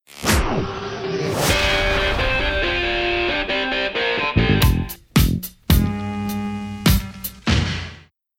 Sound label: rock bumper